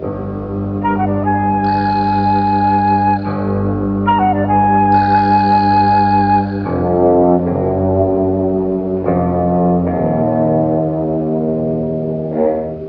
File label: strings and flute, sad; loops